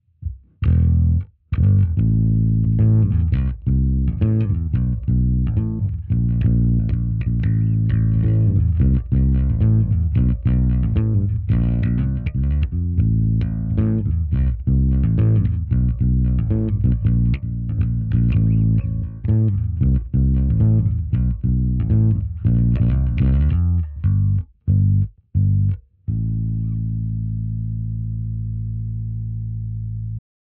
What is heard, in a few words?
bassline